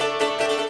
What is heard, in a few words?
royal flush; CrossCards 1.0